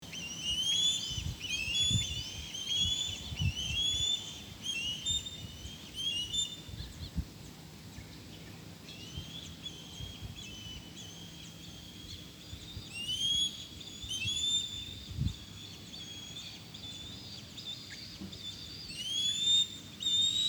Pandion Haliaetus Cristatus; Eastern Osprey; Pandionidae (Osprey)

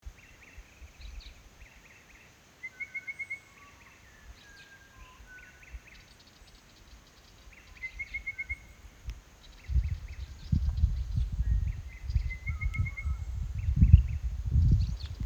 Pardalotus Rubricatus, bird species; Red-Browed Pardalote; Call 2

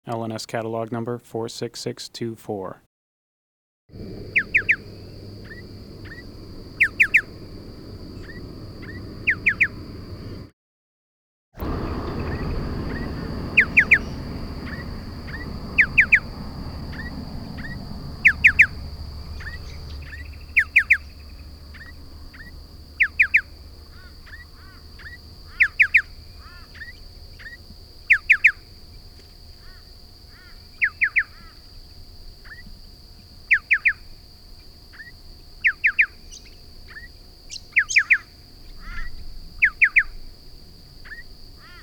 Pardalotus Striatus; Striated Pardalote

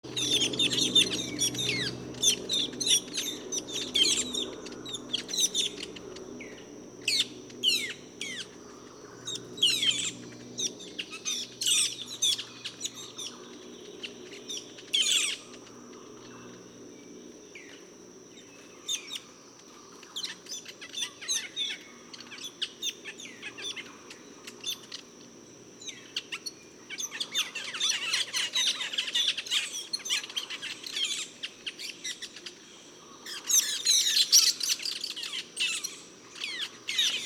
Aplonis Metallica, bird species; Metallic Starling; Sturnidae (Starlings and Allies)

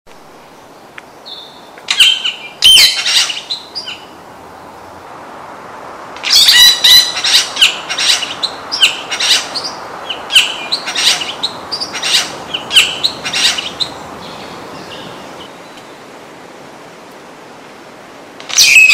Scenopoeetes Dentirostris, bird species; Tooth-Billed Bowerbird; Call 2

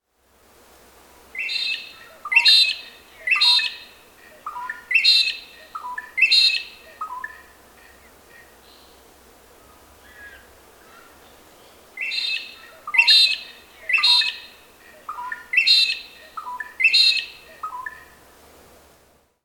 Grallina Cyanoleuca; Magpie-Lark; Call

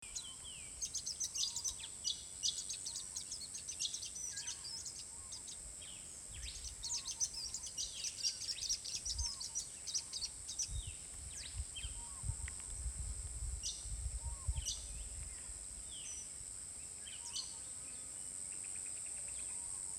Daphoenositta Chrysoptera, bird species; Varied Sittella; Neosittidae (Sittellas); Call 2

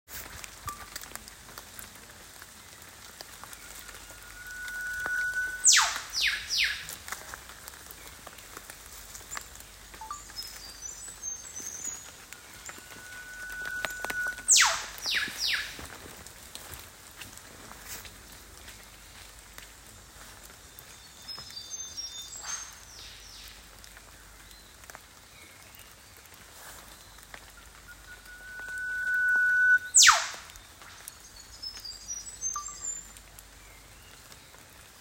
Psophodes Olivaceus; Eastern Whipbird; Family - Psophodidae (Whipbirds and Wedgebills); Call

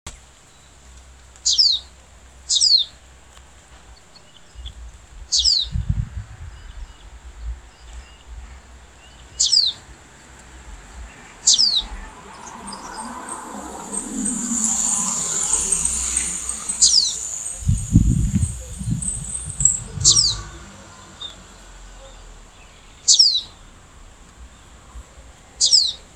Cinnyris Frenatus; Sahul Sunbird; Family - Nectariniidae (Sunbirds and Spiderhunters); Call; Call 2

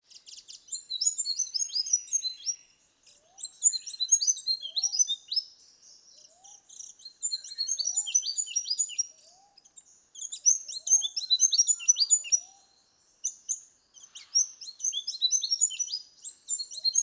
Rhipidura Rufifrons, bird species; Australian Rufous Fantail; Call 2

